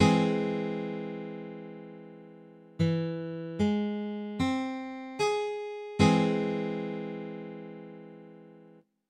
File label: Caug, Guitar Chords